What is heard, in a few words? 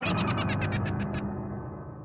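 Happy laugh; Fairy Tail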